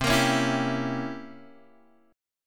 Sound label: C 7th Flat 9th C Guitar Open G